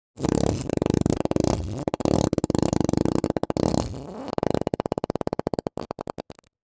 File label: Fart Vocal Diarrhea Finale Fart